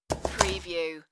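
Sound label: Cutting Crisp Produce Quick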